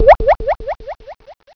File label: drops; macsounds